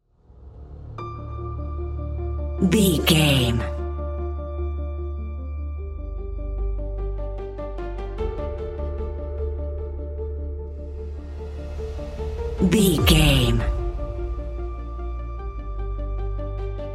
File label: cinematic epic trailer